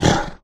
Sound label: wolf noise